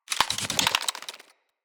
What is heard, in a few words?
Root Spider Mother Foley Spin